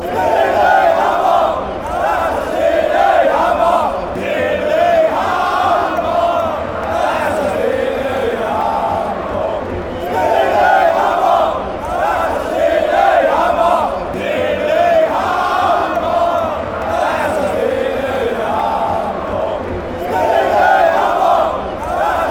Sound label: randers chant